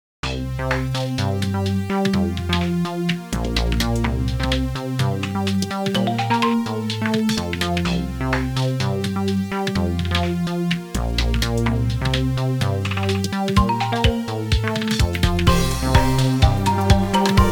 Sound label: Turbulent Xylophone (inst)